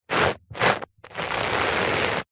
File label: household hairbrush scrape bristles on carpet